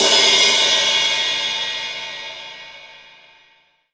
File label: O Z RIDE